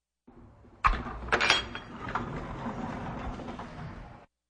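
opening of a metal latch and movement of a door on runners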